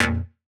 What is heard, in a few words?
Stab; neuro; Optiv & BTK